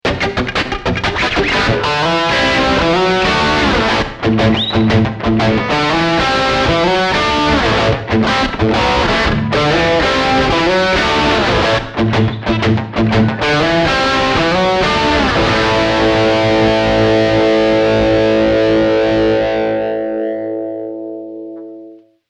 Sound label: Brittish Heavy